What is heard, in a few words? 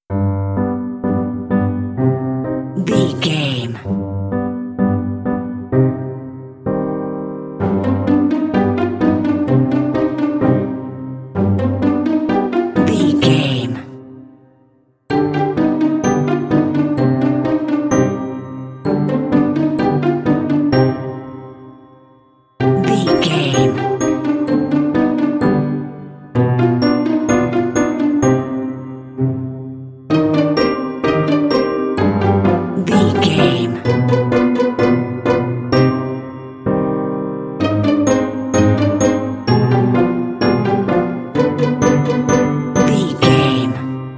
pizzicatto plucking mischief cute comedy critters